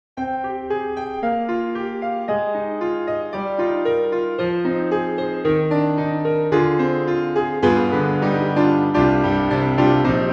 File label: B290 Wet 16 layers; EastWest; Quantum Leap Goliath